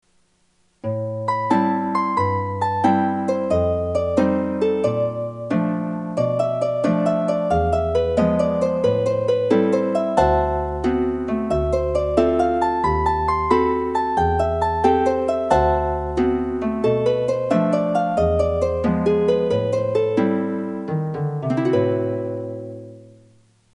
opening jazz hp

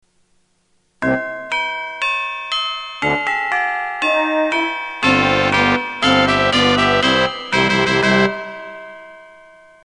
opening tubel brass

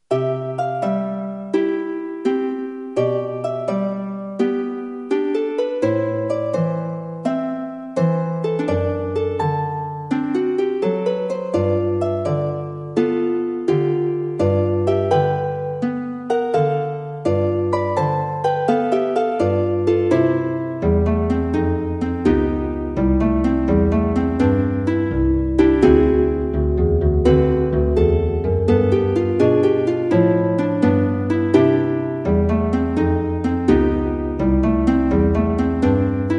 jazz pianist

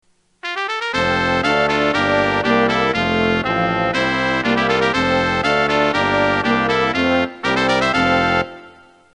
opening brass

